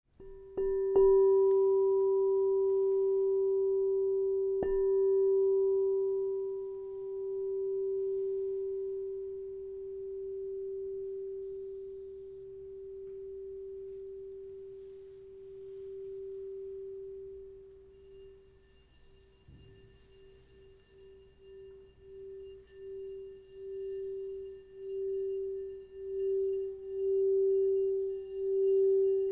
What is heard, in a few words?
Crystal Tones Singing Bowls